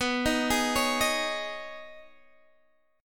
B 6th Add 9th B down Guitar Open D

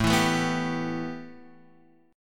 A 7th Suspended 2nd, A7sus2 chord; A7sus2 strummed; Standard

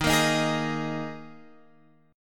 D sharp Suspended 4th, Guitar, Open F; x,10,10,10,11,10; strummed

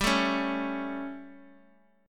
G Suspended 2nd Flat 5th G, chord

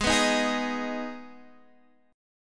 Eb Suspended 4th Sharp 5th, Ab

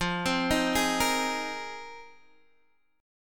F Minor 11th, Guitar Open G

